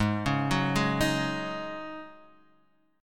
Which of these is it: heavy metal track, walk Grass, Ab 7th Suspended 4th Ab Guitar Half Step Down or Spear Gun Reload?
Ab 7th Suspended 4th Ab Guitar Half Step Down